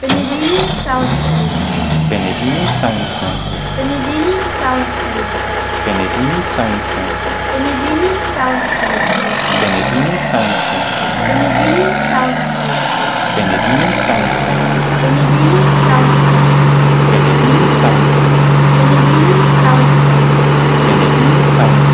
Peterbilt

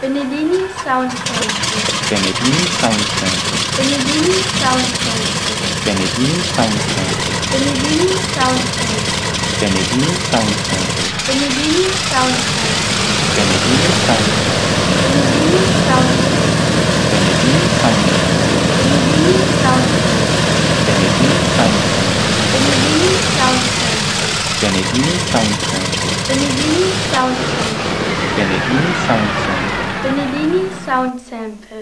R2800; P W

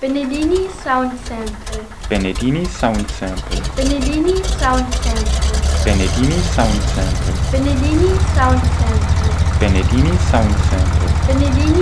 Cessna

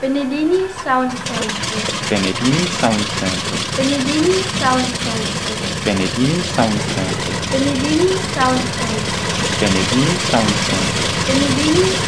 P W R2800 Twin

